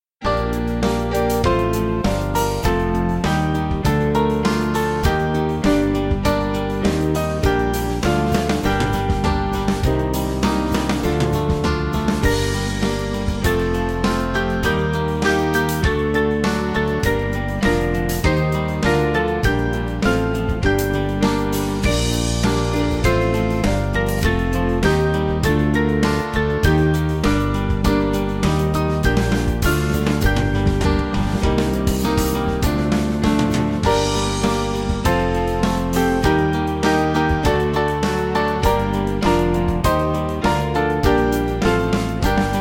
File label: What Is This Crying, Band; St Marks B